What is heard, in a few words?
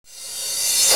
Cymbals Reverse Crash; Vengeance Samples